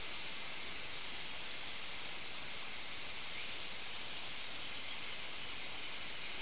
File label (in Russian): obiknovennij sverchok locustella naevia